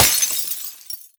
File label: break glass jar; physics; breaking